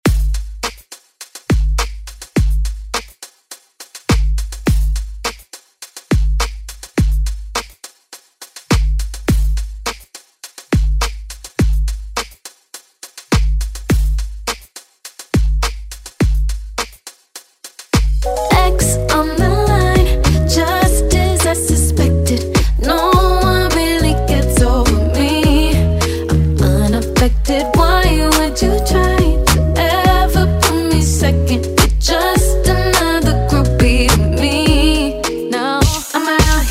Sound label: Top40 Redrum